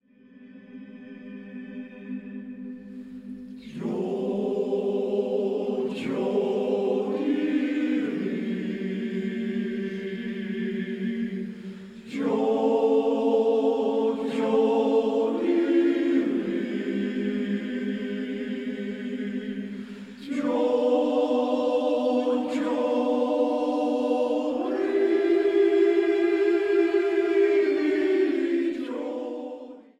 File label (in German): Andachtsjodler